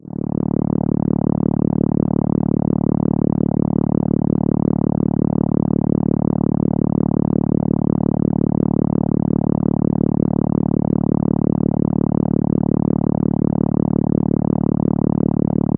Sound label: Contra D3, ARP Solina